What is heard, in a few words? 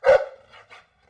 dog; ambient animal; Counter Strike Model Pack; CS:S